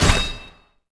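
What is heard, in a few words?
impact sword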